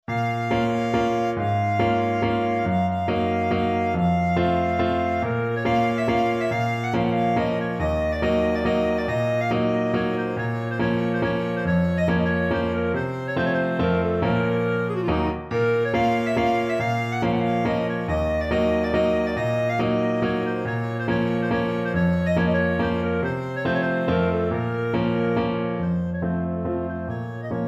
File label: kujawiak, clarinet; Kujawiak (Polish Folk Song)